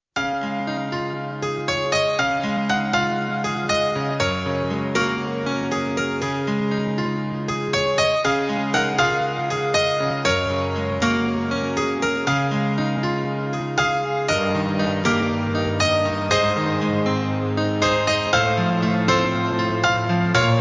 sleep music

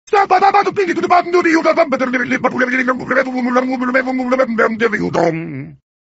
heavy

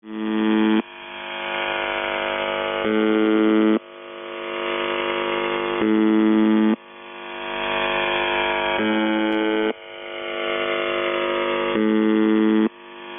buzz

